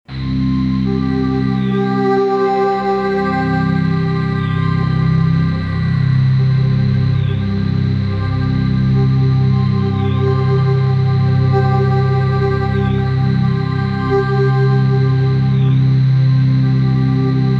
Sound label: SP404 sequenced by DT1